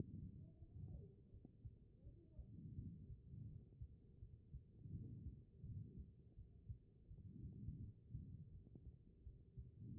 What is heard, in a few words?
HEART; B MODE